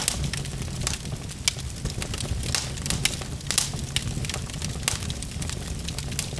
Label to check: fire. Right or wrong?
Right